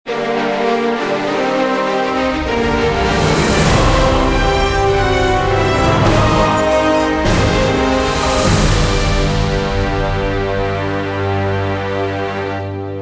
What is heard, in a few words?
Fusion Mode Sting; Titans; TempoSynced; Dawn of Titans; android